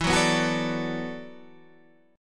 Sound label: E 7th Suspended 2nd Suspended 4th, down